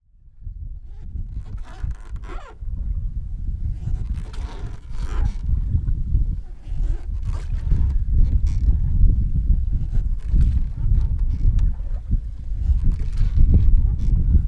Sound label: Rowboat on Ganga River Varanasi; Samuel Corwin; Selected Field Recordings from India and Nepal Volume III Environments